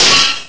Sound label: Blade Break; The Picori Blade breaking; MC